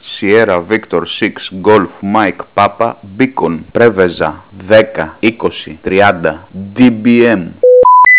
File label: tones; beacon